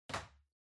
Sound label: Ceiling Light (Far RC Put)